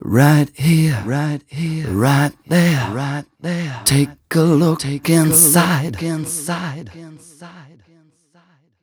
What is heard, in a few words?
m 129 mel e, Vocals